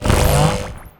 vaccinator charge tier; tf2

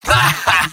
medic laugh short, mvm; tf2; garrysmod

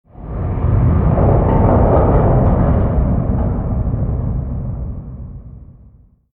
spacebase rumble; Garry's Mod; tf2

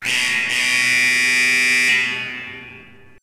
race over; Garry's Mod; racing tool

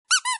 step; labubu

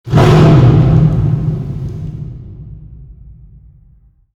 furnace shot, ambient, harbor; Garry's Mod; tf2; cp harbor

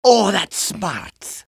pain sharp; demoman; Garry's Mod; tf2 content

